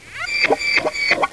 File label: pit drone communicate; betapitdrone